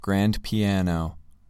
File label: grand piano